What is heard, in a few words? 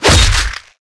Sword Critical; Scratch